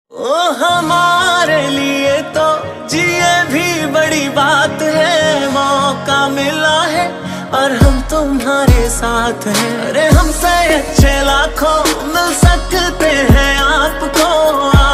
Punjabi Beats

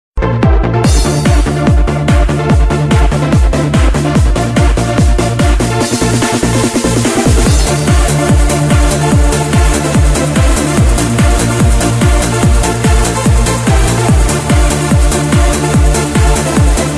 Techno Remix Ringtone

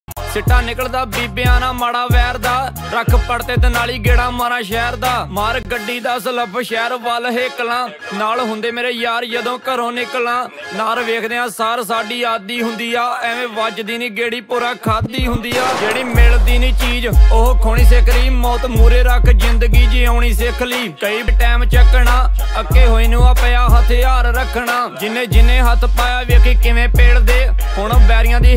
DJ Punjabi Ringtone

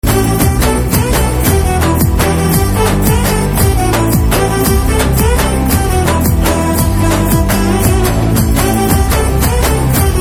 BG Tone Telugu Ringtone

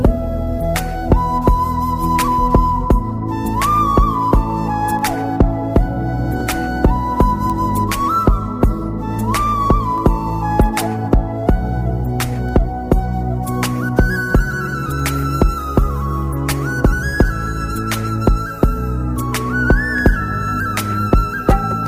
BG Tone Telugu Ringtone

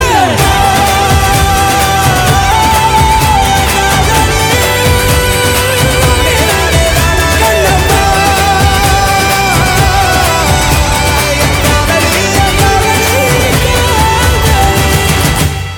Folk Version Tamil Movie Ringtone

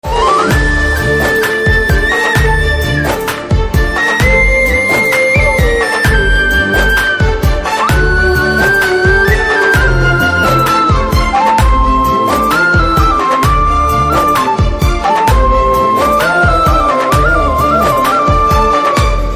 BGM Telugu Ringtone

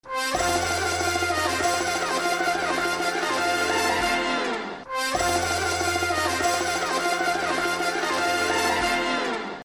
BGM Telugu Ringtone

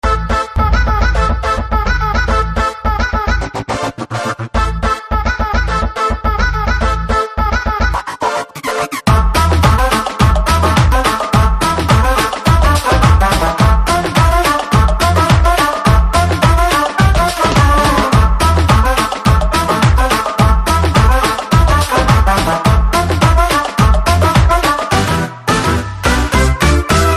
BGM Telugu Ringtone

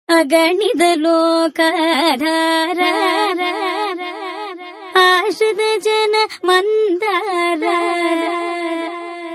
Classical Bit Telugu Ringtone; Krack

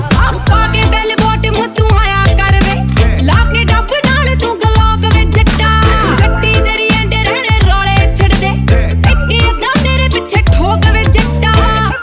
Punjabi Ringtone

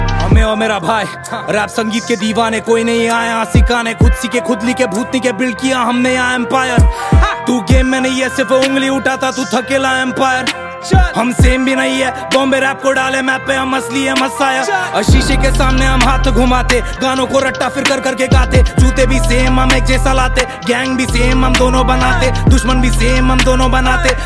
Rap Ringtone

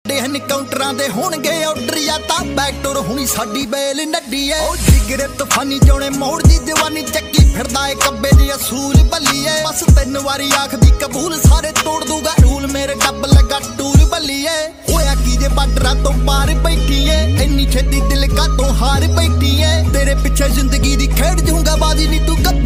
Punjabi Beats Ringtone

